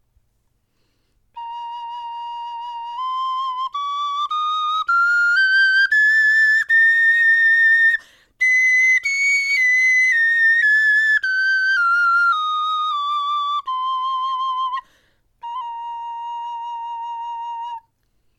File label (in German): tonleiter, knochenfloeten